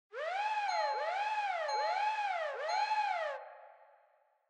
Fire Truck Siren Fake Reverb Siren

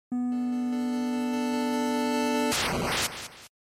NES Shooter Boss Encounter

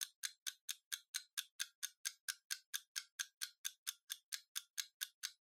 Kitchen Timer Tick Loop

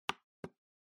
Trackpad Click L

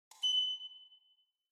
Ticket Gate Beep Tone1 Far

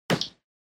Motion Grab High

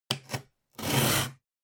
Cutter (Cut-Cardboard)

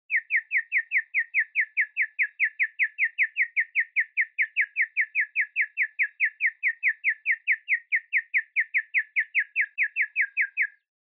Motion Dizzy Slow Long

